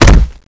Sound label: claw strike; oblivion darkangels